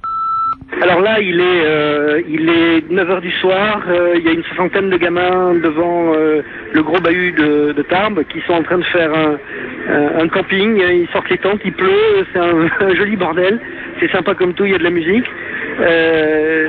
Manif lyceenne, repondeur

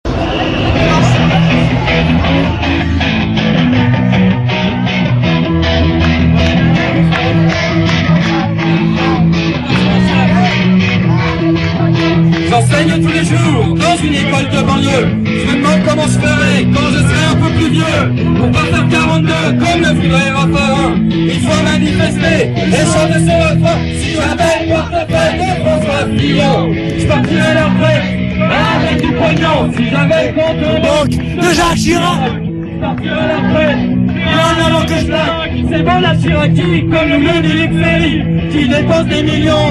Manif retraites chanson

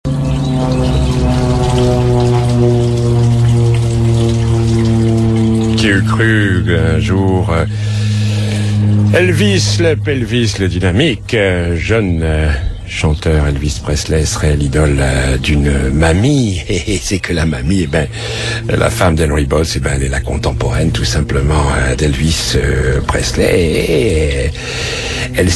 Chanteur Country

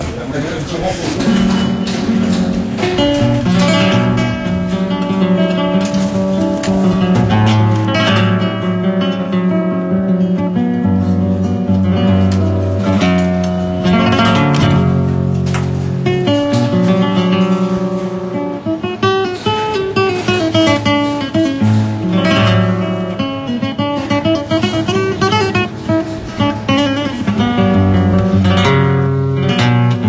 Musique argentine

